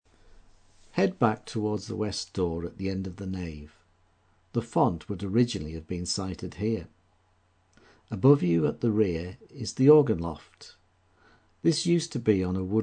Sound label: organ and bells